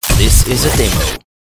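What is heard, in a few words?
Swoosh Crash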